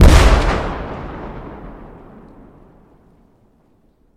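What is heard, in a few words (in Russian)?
эхо мощного взрыва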